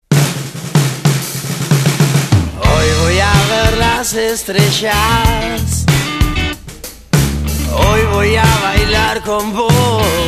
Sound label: Funk